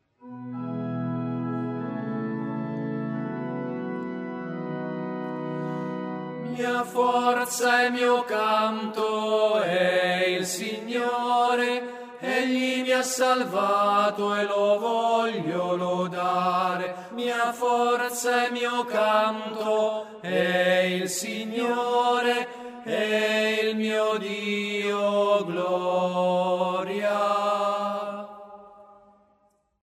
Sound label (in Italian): tenori